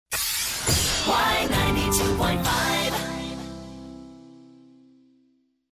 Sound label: jingle quick fast